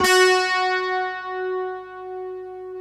GUI, Strings; Optical Media International - Sonic Images Library

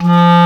clarinet F2; InVision Interactive; Lightware VOL-1; Instruments & Percussions

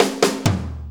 4-4 Fill, Drum Loops

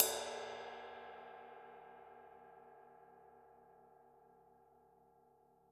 RIDE OH, KIT; Q Up Arts; Denny Jaeger Private Collection

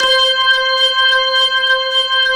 C5, Keyboards Old School; USB Soundscan vol.09; AKAI; BIG HAMM

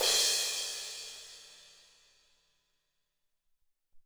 Amb Crsh, Alesis SR-18

